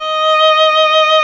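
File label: violin D#5 left; Greytsounds VOL-1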